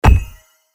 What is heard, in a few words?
hammer hit; Crystal Furnace